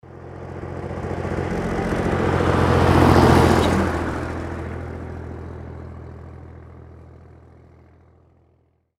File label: old tractor pass by